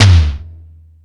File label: More Toms, E-MU Pro-Cussion